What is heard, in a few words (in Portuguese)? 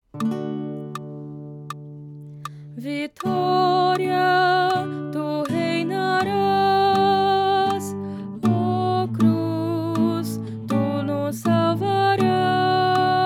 Contralto